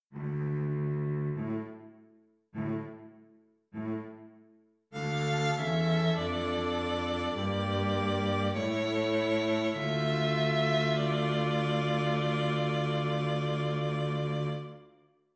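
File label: String Quartet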